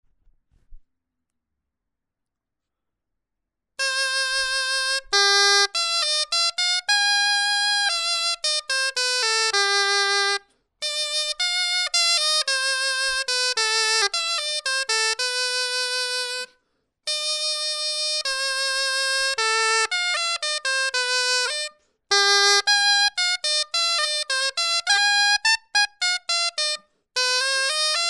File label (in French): clarin etude